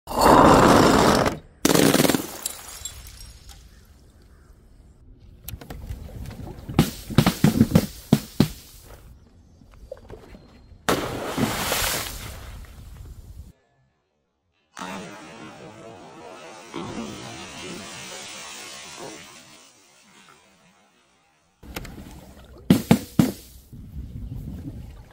Crushing Crunchy Soft Things Breaking Glass Bottles, asmr; satisfying